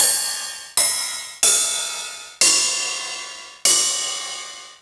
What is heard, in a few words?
909 mod RIDE CYMBAL